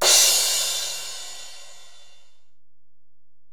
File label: Ride, Alesis DM-PRO, Drum Machines